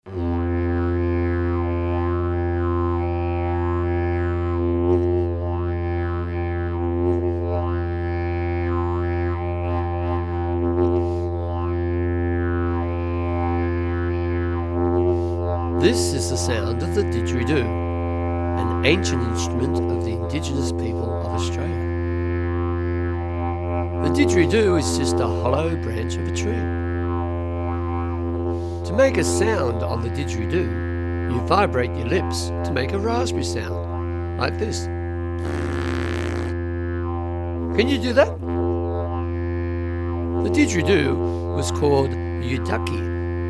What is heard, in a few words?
Sounds of the Didjeridu, Hear the sounds of the Didjeridu (Didjeridoo ) and Clapping Sticks